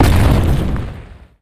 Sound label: Boulder Bounce; Ruins; Timez Attack